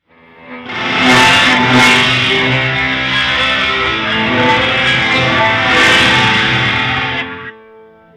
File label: guitar; PHYSION Mk II; PHYSION Ghost Guitar